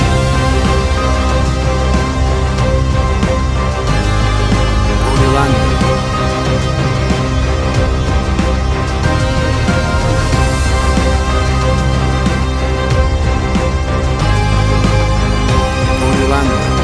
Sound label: Booming Bass Synth Action